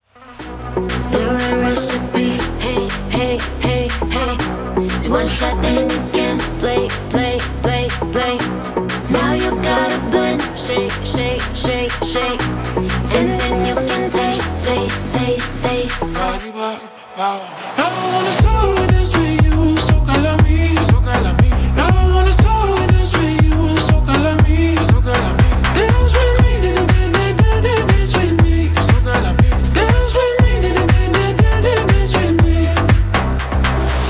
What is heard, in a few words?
slow dance